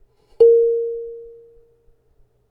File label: Kalimba Bb3 Wipe Med